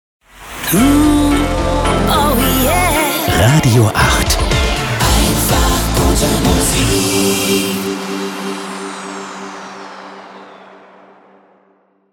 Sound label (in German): Transition Slow Pop 90er 2 med Pop aktuell; RADIO 8